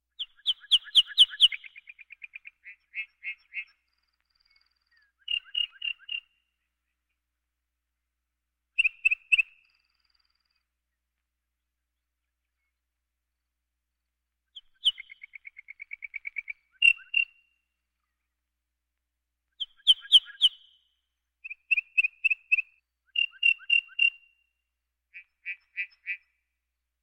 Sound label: Birds